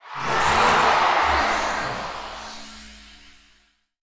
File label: crowd death; svencoop; thearena